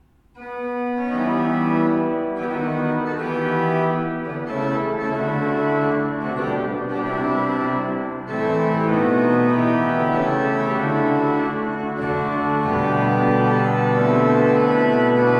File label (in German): Beckerath, gebrauchtorgel